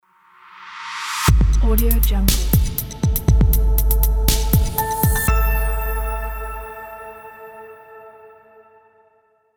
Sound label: minimalistic reveal logo